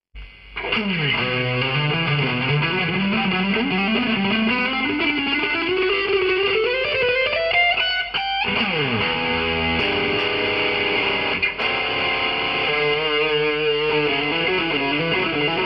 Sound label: rock metal